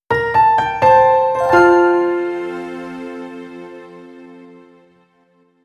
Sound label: Sound Logo